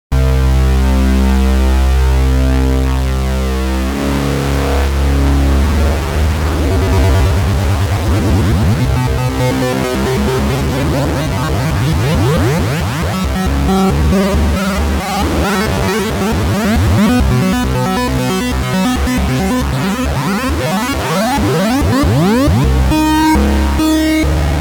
midibox sid v2

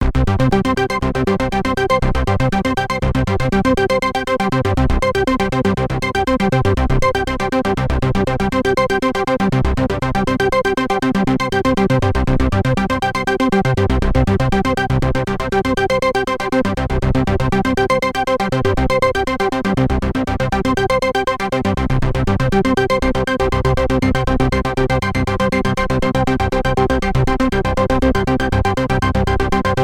arp slow, midibox sid

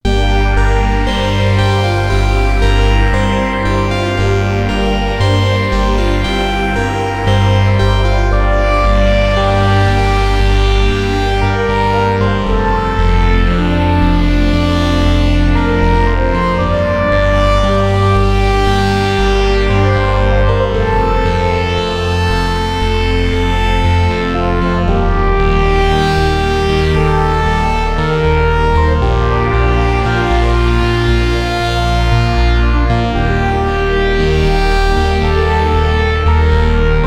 midibox sid v2 superpoly experiment

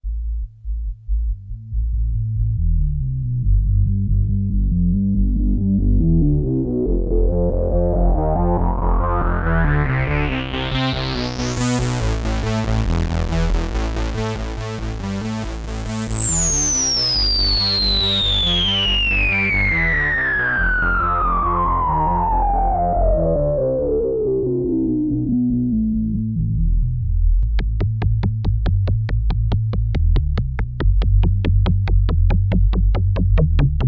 moog bass

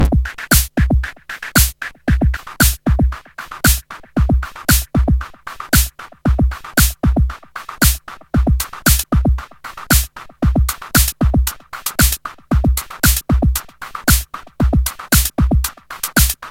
swinsid drums; Drum Kits; New food for the drum machine

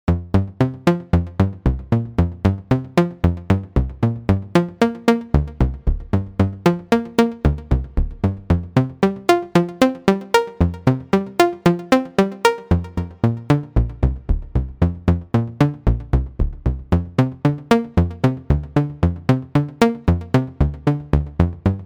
lfo; MIDIbox SEQ V4 Lite

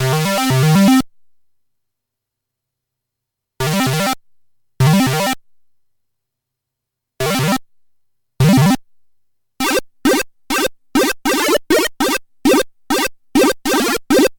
short arp, midibox sid; arpeggios are created in the same manner like known from old C64 tunes

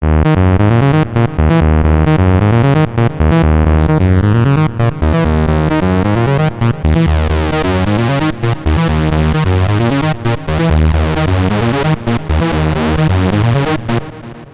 osc finetune, midibox sid; finetune on three oscillators

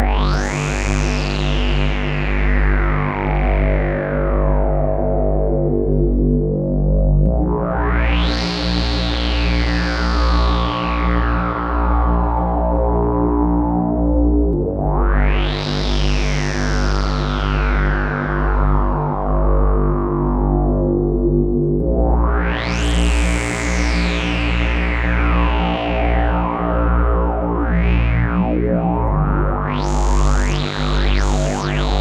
bass stereo, midibox sid; kobol; midibox sid v2

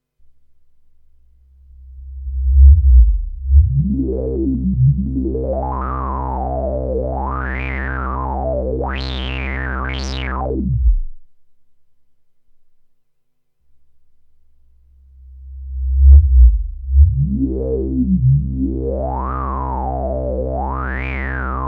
mbsid filter interpolation

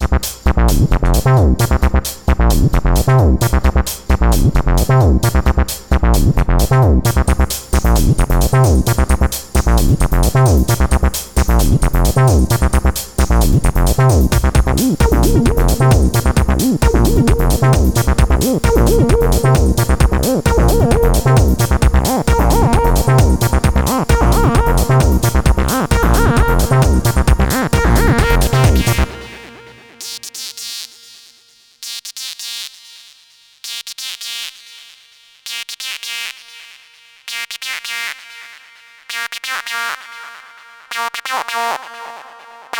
bassline multi, midibox sid

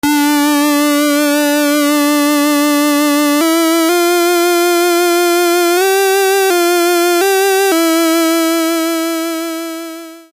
midibox sid

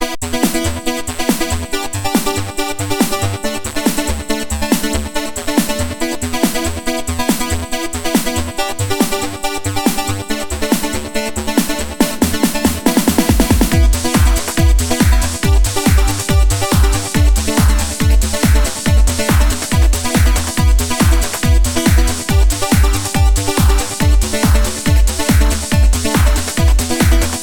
poly arp, midibox sid; Polyphonic Arpeggios

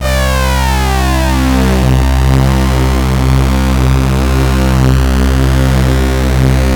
syncsaw stereo, midibox sid; stereo patch; at least two SIDs

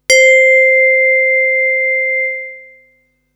mbfm background noise, midibox fm

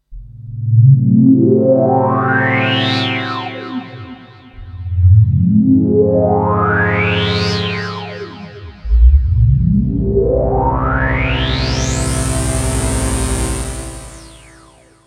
midibox sid v2 cv out

